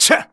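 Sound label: Mon Man ATTACK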